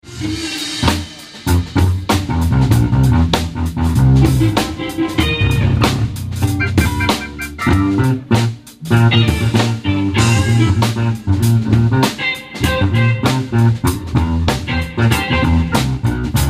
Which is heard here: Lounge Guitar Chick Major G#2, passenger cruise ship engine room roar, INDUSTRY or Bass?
Bass